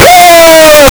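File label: wolf attack bark aggressive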